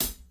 sizzly closed hi hat sound e key

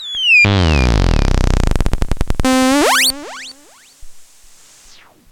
square; analog synth; loops

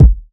raw steel kick drum g sharp key

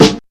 Low Acoustic Snare D Key, steel snare drum; snare drum samples

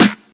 sharp steel snare drum A key; snare-drum-samples

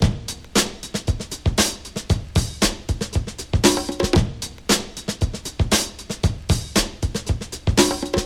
116 bpm drum loop f key

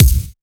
rich high end steel kick drum c key; tuned drums

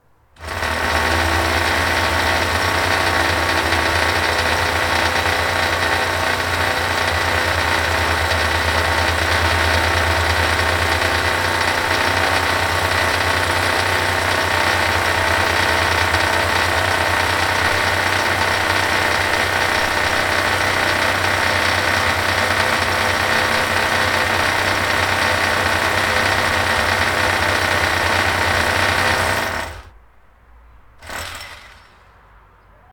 continous power drill

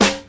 rich top end steel snare drum C sharp key

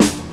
original snare drum g key; snare drum samples

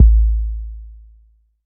solid kick single hit a key; kick drum samples

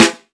airy snare single hit e key; Bitdepth 24; snare drum samples

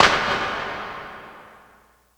clap one shot D key

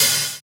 open hat d key; drum single hits one shots

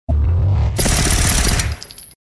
rapid gunshots

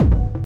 delayed main room techno kick loud; kick drum samples

